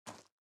SFX run gravel

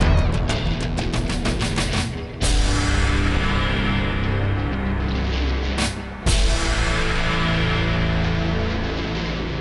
sounds music; Halo Combat Evolved; Xbox

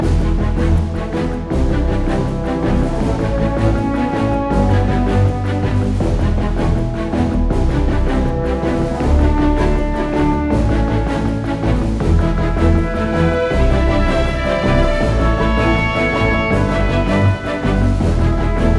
Menu Rewards Loop; Lama

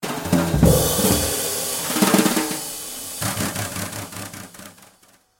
Drums Crazy solo

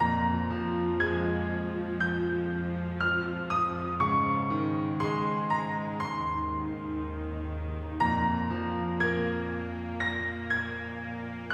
Piano Menu; Lost Kingdom; Adult Puzzles Fantasy Ladies

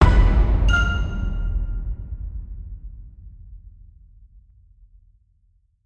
Boom

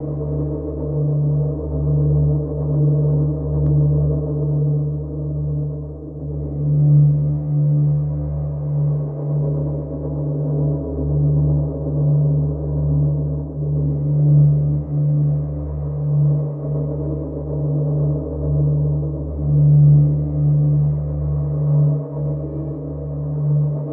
SYNTH AMB LP; transformers war for cybertron gamerip; IACON INVASION

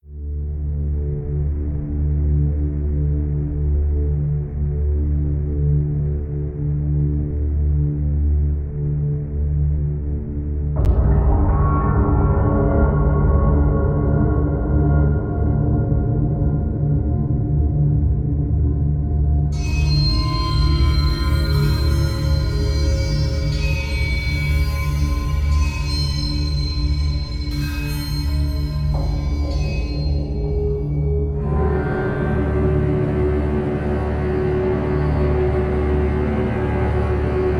Intro Music

